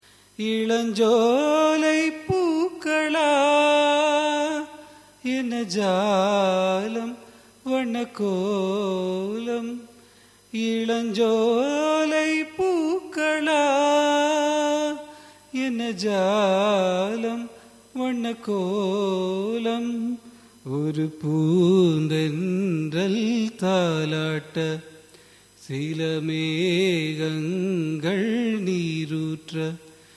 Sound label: raga dharmavati cine, carnatic music